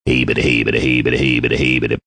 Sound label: Heybedehey, Male, Singing